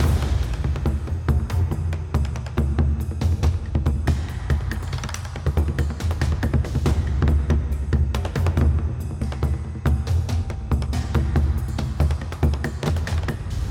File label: bgm freespin